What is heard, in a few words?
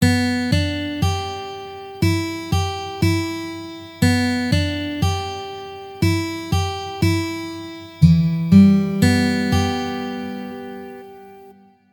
Repeat Sign Example